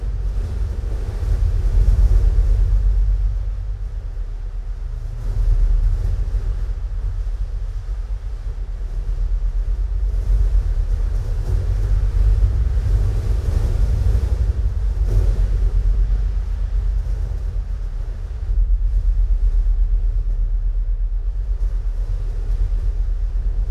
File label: environment temple winds indoors